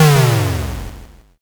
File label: explosion m